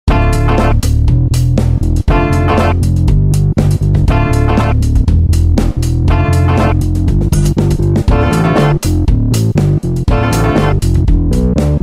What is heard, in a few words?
Funk